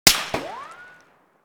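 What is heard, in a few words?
concrete distant; Escape from Tarkov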